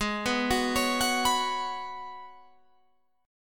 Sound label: G sharp Minor 11th G sharp Guitar Open F, chord; G#m11 arpeggiated; 20,18,18,20,21,18